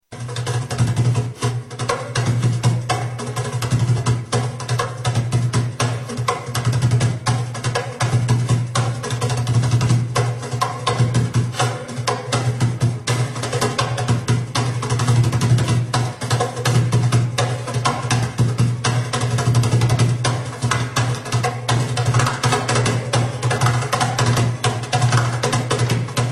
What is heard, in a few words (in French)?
instruments cajon